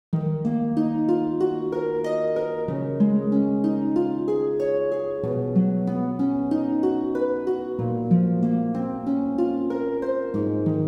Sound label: Harp Version; Cry-A-Live; Live A Live HD-2D Remake Original Soundtrack